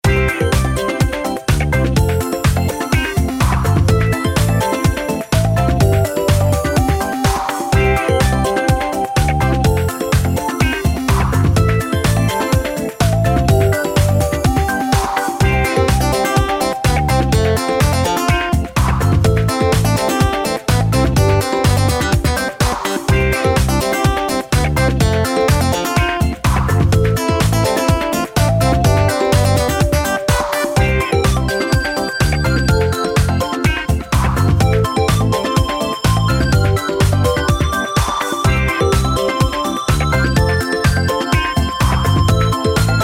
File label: Level Music; Family Restaurant